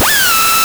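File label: oil press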